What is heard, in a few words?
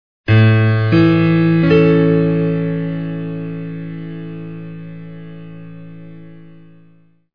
piano